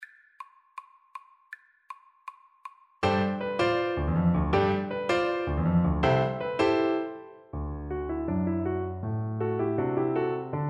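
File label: VLC karaoke, Cello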